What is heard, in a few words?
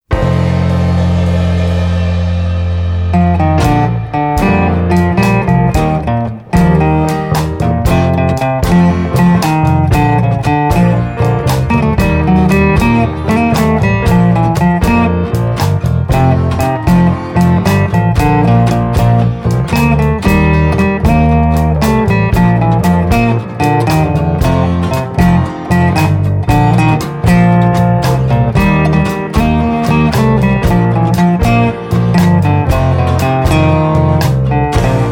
Instrumental